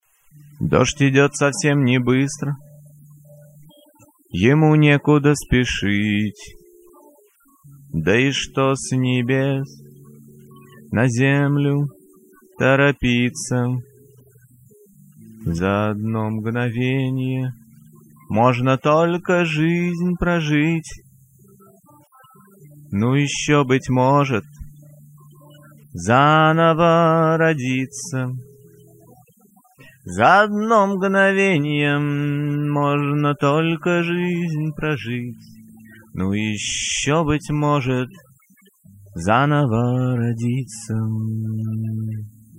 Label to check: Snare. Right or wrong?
Wrong